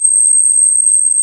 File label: CMI ORGAN